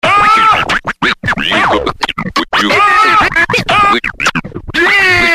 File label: Mixed Scratch